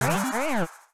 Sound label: complete